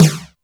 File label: Snr Flange, Alesis D4 drum machine